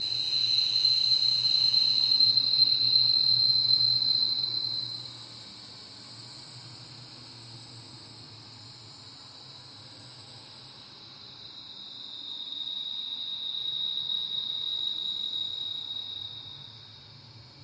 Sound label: noise pollution stretched